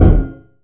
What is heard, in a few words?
epic metal soft